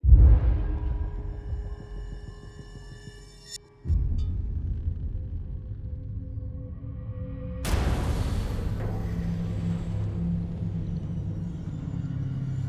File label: Cracked Drum Mix, Horror; Anger Music Library